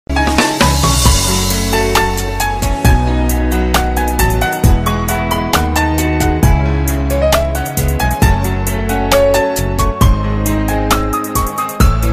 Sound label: romanian love piano